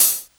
treble heavy hi-hat sample e key

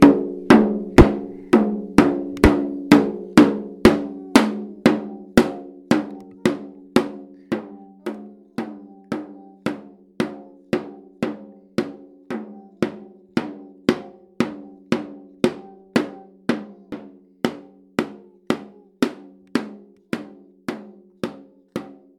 little boy playing a toy drum

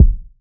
tight lows kick drum single shot g sharp key

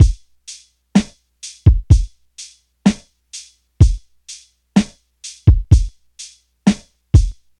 63 bpm drum loop c key

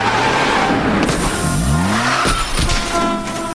air hissing horns honking tyres scretching

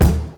clean jazz kickdrum d key; 60s

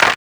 old school snare A key

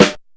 round steel snare drum sample d sharp key

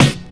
rich lows steel snare drum sound c sharp key

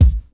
pop kickdrum c key

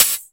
shaker one shot e key; percussion

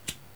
bright lighter

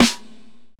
subtle reverb steel snare drum sample A#; Duration 0:01

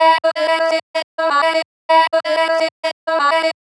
chopped vocals female E minor 111

